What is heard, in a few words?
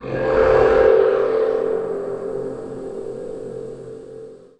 Mountain Guardian Leap Up DISTANT; Journey